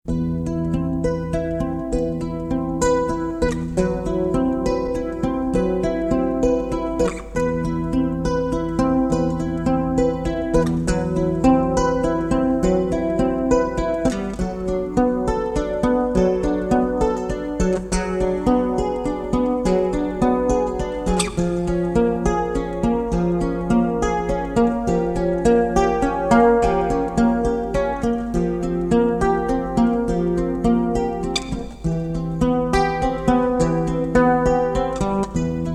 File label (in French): Classique